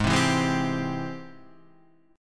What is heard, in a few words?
G sharp Augmented Major 7th G sharp, guitar; G#+M7 Chord {4 3 2 1 1 3} strummed